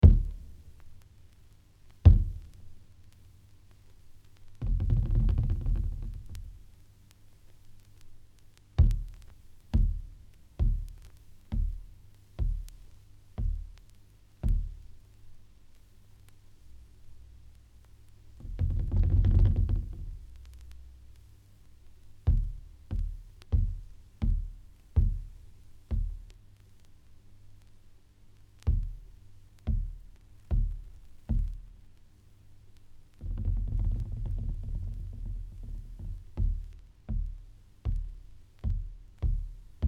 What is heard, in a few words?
African Suspense; KPM 1000 series